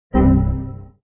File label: Windows XP Critical Stop